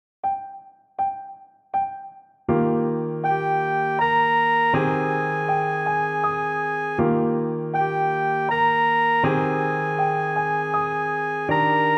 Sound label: trumpet